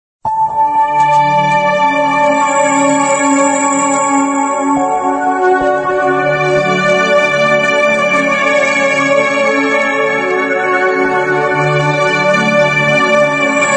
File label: Ringtone